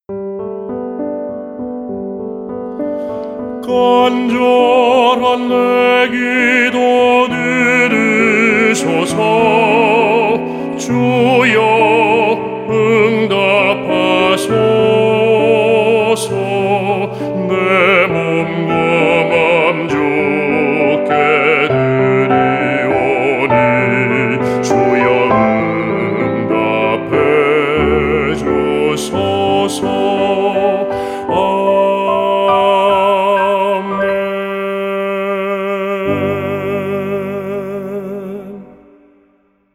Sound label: BASS